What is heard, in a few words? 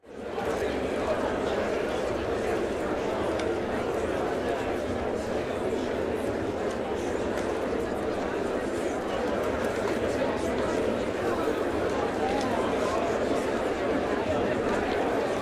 Crowd in a public area